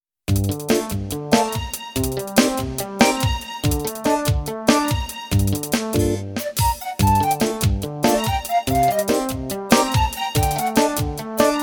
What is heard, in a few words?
brass minus